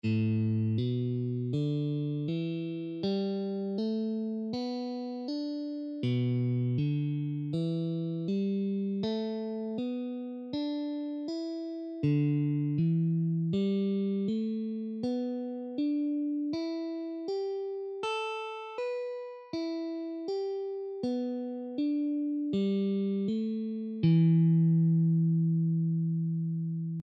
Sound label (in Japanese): ハンマリング トレーニング; hammering beginner control exercises